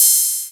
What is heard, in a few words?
Cymbals Ride; Vengeance Samples